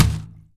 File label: Hit Generic All